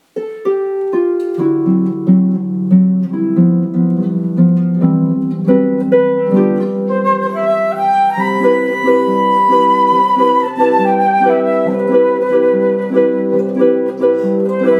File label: flute and harp